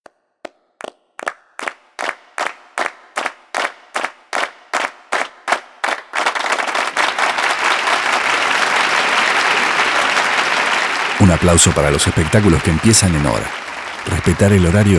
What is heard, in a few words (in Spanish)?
Aplausos